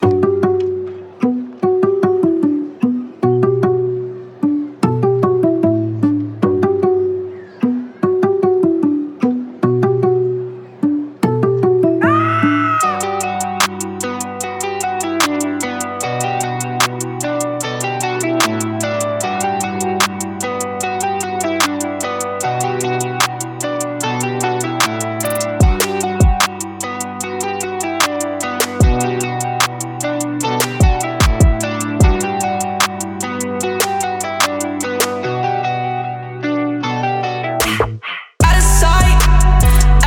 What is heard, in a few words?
Sad Rap